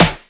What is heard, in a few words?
bad snare